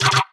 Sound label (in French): Cadenas; Puddle